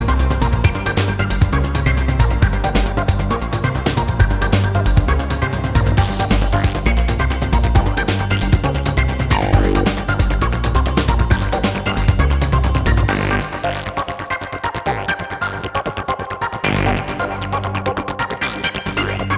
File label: hammond